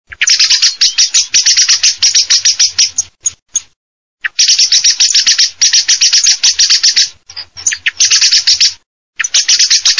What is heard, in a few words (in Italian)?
schiamazzo